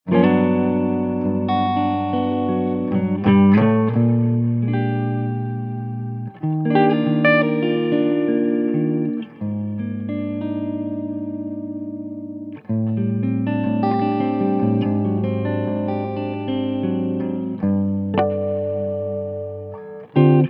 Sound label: Clean breakup Gibson ES335 Jazz